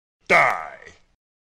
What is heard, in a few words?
die effect